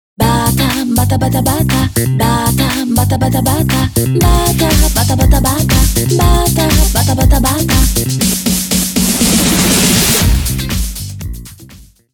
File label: jingle